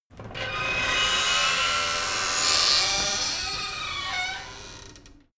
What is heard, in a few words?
metal door screak thriller close slow